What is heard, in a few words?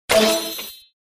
Level Up